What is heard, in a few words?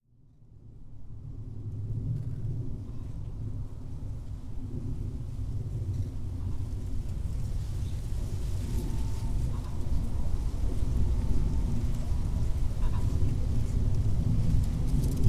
field recording